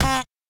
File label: Weapon Vuvuzela Hit; Pulling No Punches